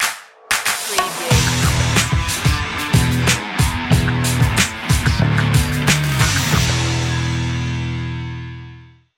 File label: sharp hip hop intro